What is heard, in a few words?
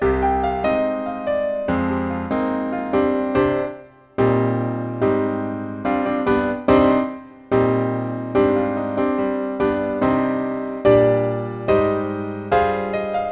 accomp; battle of jericho